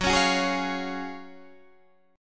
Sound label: F sharp Major 7th Suspended 2nd, Guitar, Standard; strummed; JGuitar